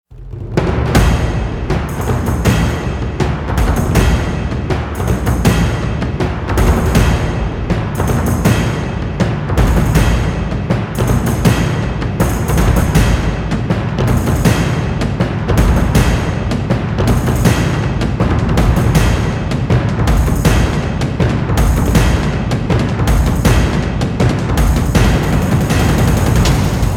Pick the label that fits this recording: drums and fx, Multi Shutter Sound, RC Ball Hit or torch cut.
drums and fx